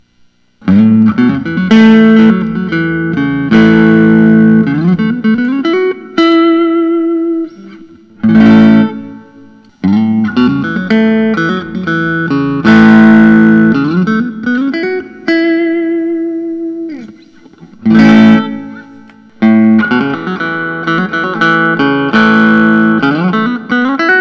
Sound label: lentz dl 90 olympic white